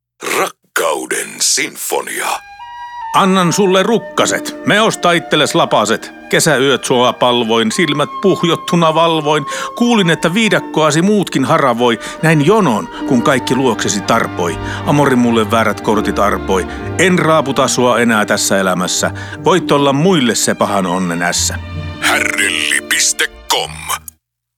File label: Sinfonia; Hardelli; Sinfonia 2